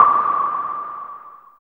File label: JUNO NOISE, JUNO PERC; Drums In Your FACE!